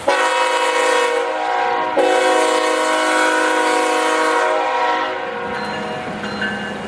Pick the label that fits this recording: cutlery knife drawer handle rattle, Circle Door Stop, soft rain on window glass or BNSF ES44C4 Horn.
BNSF ES44C4 Horn